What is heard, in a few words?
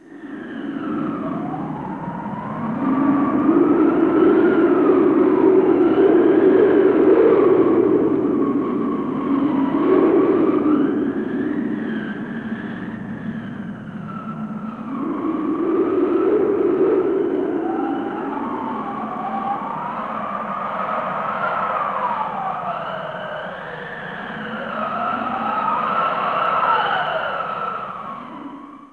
valley wind whistle; ambience stereo